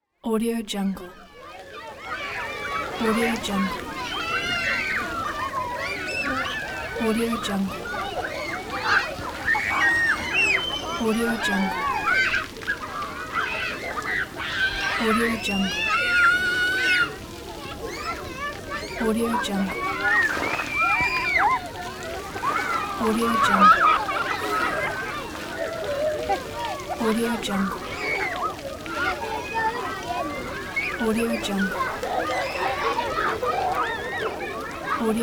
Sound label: children crowd screaming while playing at water